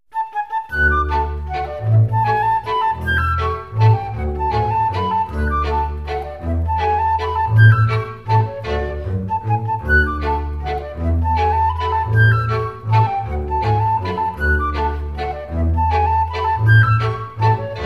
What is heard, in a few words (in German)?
Historische Floetentoene Floetten Laendler